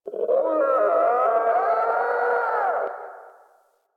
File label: Landscape Distant Creatures, Ambience; Warframe; Venus Open World; One Offs